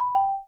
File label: lose